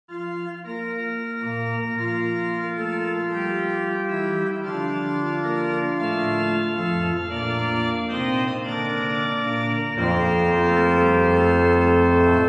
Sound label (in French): Messe Paroisses Sanctus Pedale en Double Canon, Organ Music, Souvigny; Sanctus 1; Couperin Francois